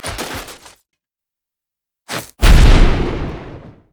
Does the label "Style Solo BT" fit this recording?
No